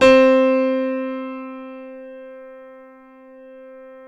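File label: C3 piano right; Northstar - New Gold VOL-2; BOS.GRAND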